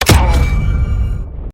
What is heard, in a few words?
headshot sound effect; call of duty modern warfare 2019 warzone